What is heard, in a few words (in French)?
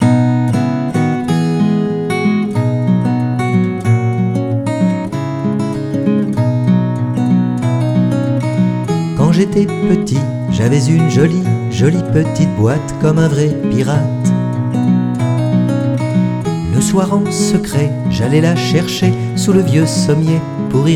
Petite boîte (Chanson)